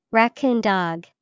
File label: racoon dog